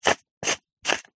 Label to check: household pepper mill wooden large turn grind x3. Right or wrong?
Right